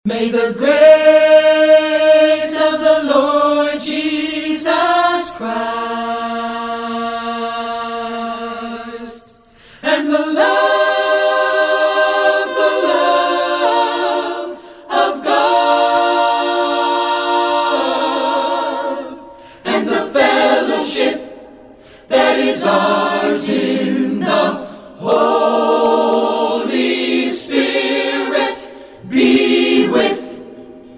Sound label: His Grace Acapella; worship